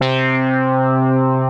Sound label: E Series Brass, Synth Brass; Ableton; Creative Extensions; Poli